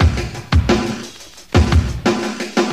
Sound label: echoes, Boombap drum loops